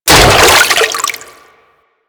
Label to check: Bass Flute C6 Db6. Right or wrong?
Wrong